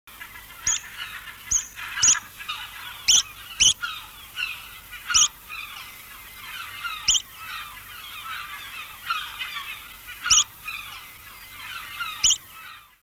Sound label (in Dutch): oeverpiep; tijdens de vogeltrek